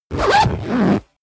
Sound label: zipper midsize run fast; objekte; reissverschluss